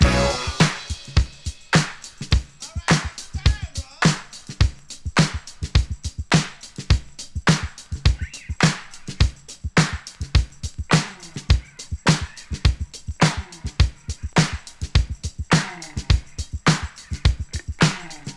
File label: 104 bpm hq drum beat B key; tuned drums